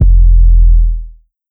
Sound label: Kick Pump It